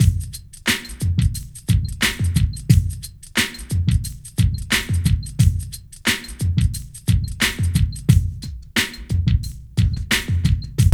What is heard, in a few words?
PHAT LOOP; E-Lab; X Static Goldmine 2